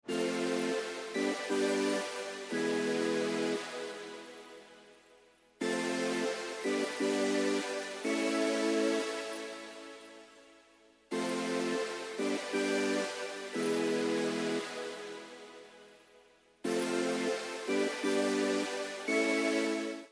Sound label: main synth; Hip Hop /R&B instrumental